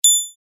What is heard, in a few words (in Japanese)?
場面切り替え ピンッ